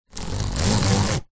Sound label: household blind roller pull chord rub clicks